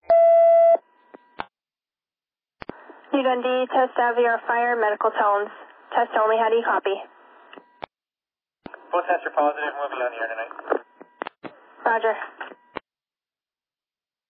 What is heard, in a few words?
fire page received; new dundee